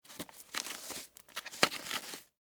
Tennis Shoes Untie, Foley, Tennis, Shoes, Untie, Laces; Shoe Sound Effects; Shoes (Sound Effects:Objects); BlastWave